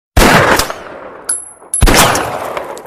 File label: Shots